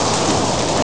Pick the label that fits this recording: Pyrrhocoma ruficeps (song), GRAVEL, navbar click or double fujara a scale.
GRAVEL